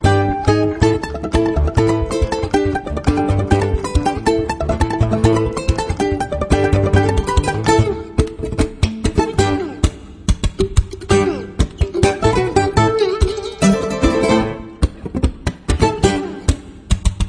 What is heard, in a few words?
conga